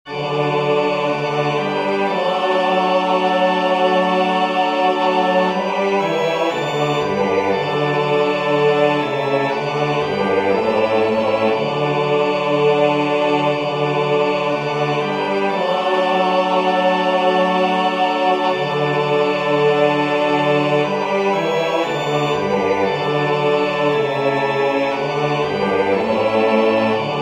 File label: Nunc Dimittis bass; singing divine service iii in parts